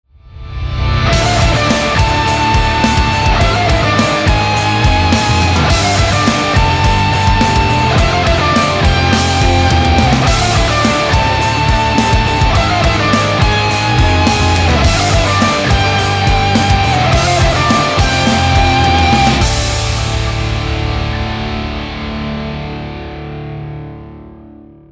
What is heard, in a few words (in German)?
klingelton